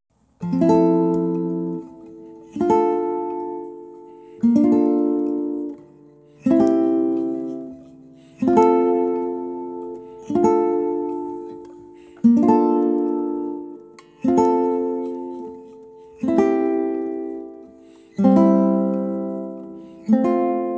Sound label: Arirang simple strum, ukulele